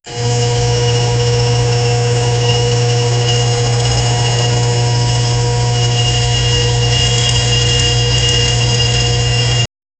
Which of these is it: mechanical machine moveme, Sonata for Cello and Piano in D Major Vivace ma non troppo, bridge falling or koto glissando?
mechanical machine moveme